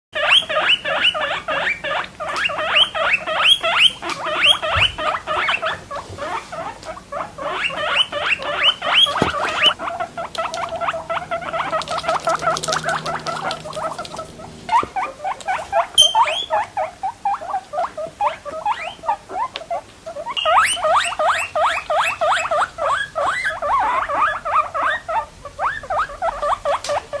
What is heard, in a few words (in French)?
cochon d'Inde